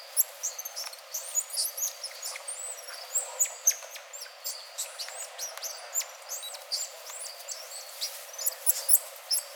Saguinus midas group; Red-handed tamarin; The calls of the red-handed tamarins are very variable. The call-over in a group

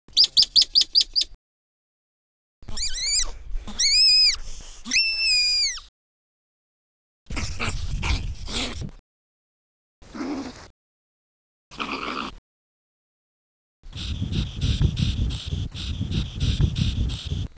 Spermophilus fulvus repertoire; yellow ground squirrel; The vocal repertoire: alarm call, scream, grunt, snarl, chirr and pant

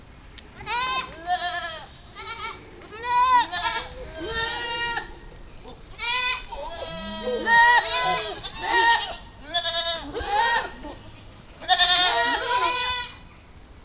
Capra domestica kamerun; Calling-over in a herd of indigenous Camerun goats

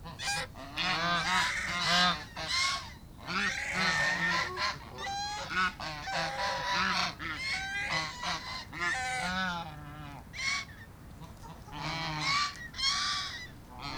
Anser cygnoides group; The loud calls from a group of five adult birds

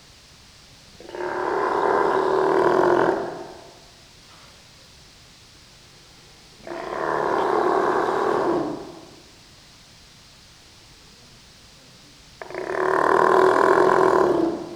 Eumetopias jubatus roar; Steller's sea lion; A young male is calling when communicates with a human trainer

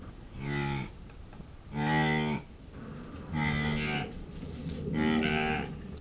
Saiga tatarica female; The quiet mooing of a female

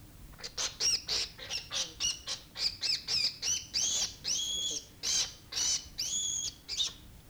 Spermophilus pygmaeus threat; Little ground squirrel; The calls of a hand-held adult animal

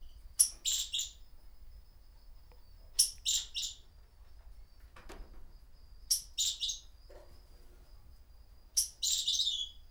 Funambulus pennanti trill; Trilling song of a male courting a receptive female; Order Rodents (Rodentia)

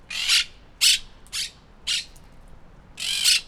Myiopsitta monachus; Monk parrakeet; Calls of a single bird

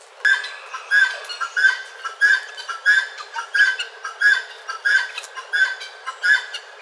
Numida meliagris trill; Guinea-hen; Trilling call sequence

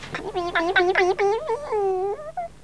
Vulpes vulpes articulation; Foxes can use articulation to make their calls resembling talking. In reality, these vocalizations represent aggressive calls toward a human

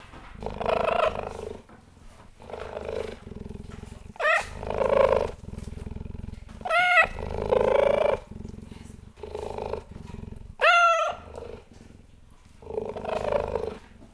Acinonyx jubatus; An adult female simultaneously purrs displaying its friendlessness to the keeper and meows, begging the for meat; cheetah

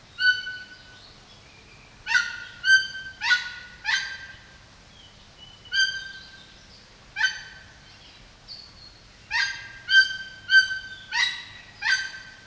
Ramphastor sulfuratus; Rainbow-billed toucan; Loud calls of an adult bird; Order Piciformes (Piciformes)

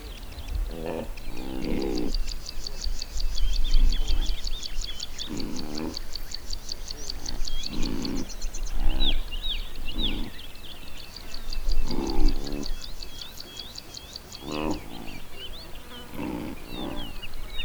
Aepyceros melampus female; Impala; Контактные крики нескольких самок импала, небольшая группа идет по дороге мимо наблюдателя, несколько самок кричит

